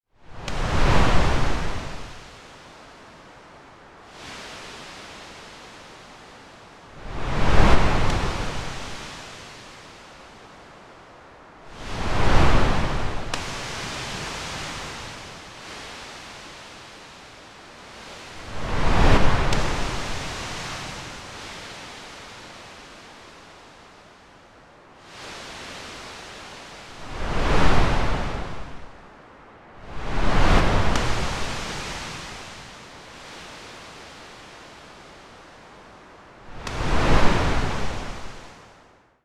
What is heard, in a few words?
wave rocky